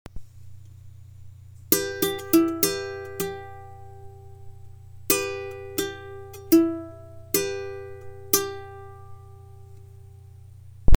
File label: Em, ukulele